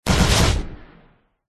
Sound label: science fiction blaster gun automatic shoot fire rounds; zapsplat